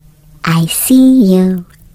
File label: turret active; video games; npc; source engine; portal; turret floor